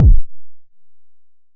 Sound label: Jomox MBase, drum machine; Jomox MBase 01